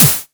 Snare; Vengeance Samples

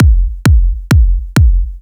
132BPM Kick 4/4; Drums & Perc; G#major

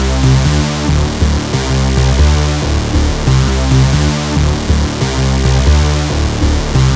138BPM Melody; VFH1 138BPM The Epic Kit

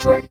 Synth Voc; Vengeance Samples; VEE Synth Shots

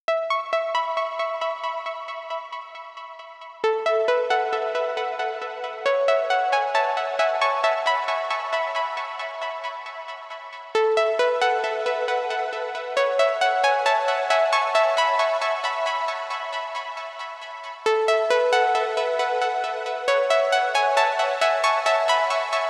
135BPM Pluck Intro, F#minor; Unlooped

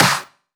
Claps & Snares

